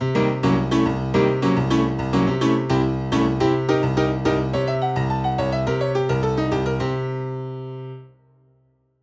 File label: Jazz Symmetrical Scales Pedal Point; How To Improvise With Symmetrical Scales